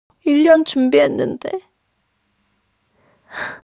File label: crying